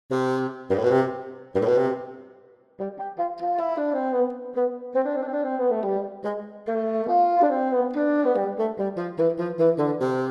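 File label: bassoon orchestra excerpt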